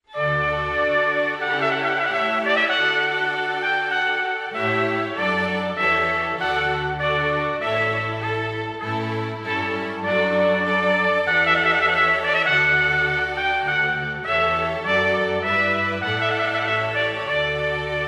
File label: trumpet voluntary